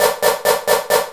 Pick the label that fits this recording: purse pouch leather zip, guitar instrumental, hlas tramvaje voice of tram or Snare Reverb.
Snare Reverb